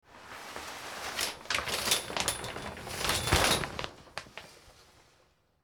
Shower Curtain

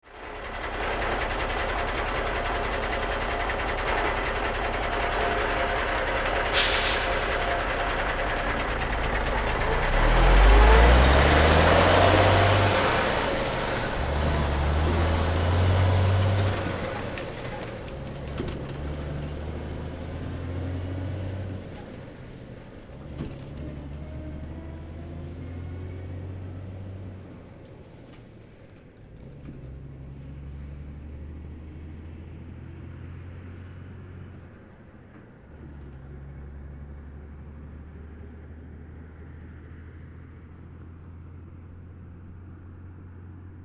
Truck Pull Away

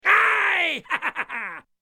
Laugh Cartoon

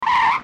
Airplane Landing Skid

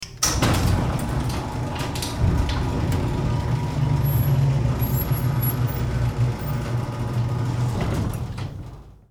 Door Garage

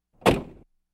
Domestic Car Door Closing

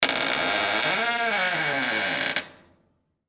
Door Squeak

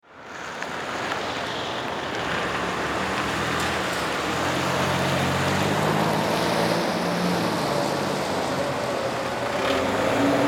Traffic City

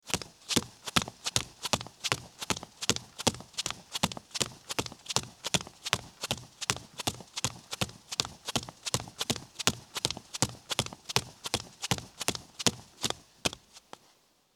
Footsteps Bare Run

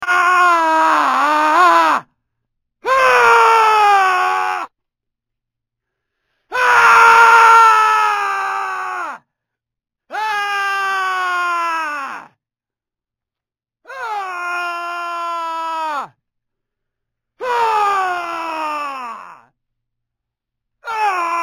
screaming man

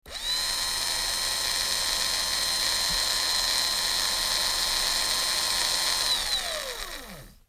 Servo

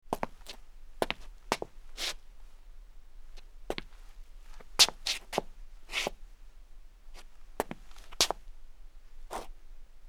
Footsteps Hard Concrete Scuff

